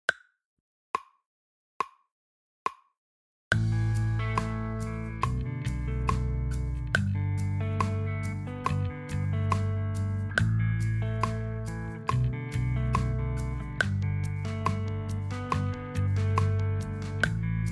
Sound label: drumless